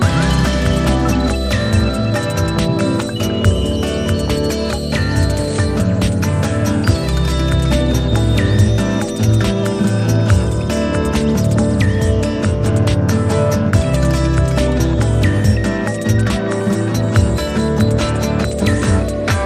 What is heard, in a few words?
INSTRUMENTAL